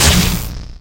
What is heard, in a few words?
thunder, battle